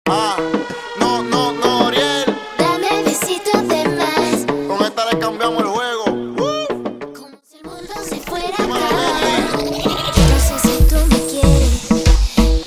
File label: Remix 95BPM